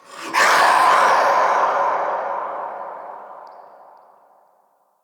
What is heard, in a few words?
Ghost Death, Vocals; Characters; Enemy; Nightfold Test Range Ghosts